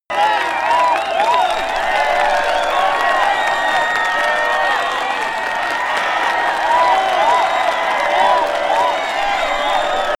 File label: cheering